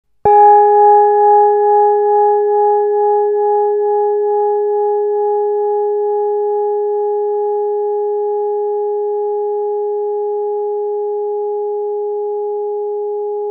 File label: gong oblong